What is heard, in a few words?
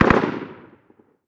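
gun m240 distant; Call of Duty Modern Warfare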